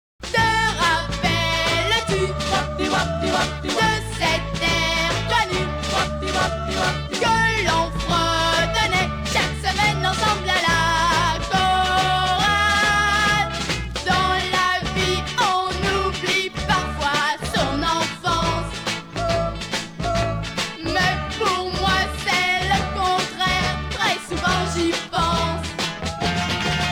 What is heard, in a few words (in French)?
chorale